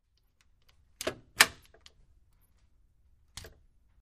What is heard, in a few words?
Lock And Unlock Bolt In Glass And Wood Door With Key, X3